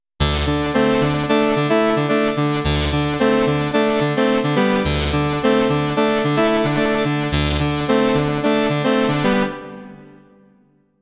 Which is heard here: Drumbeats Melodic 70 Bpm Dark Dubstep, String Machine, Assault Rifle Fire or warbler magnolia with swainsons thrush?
String Machine